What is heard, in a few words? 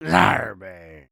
stun; creatures organic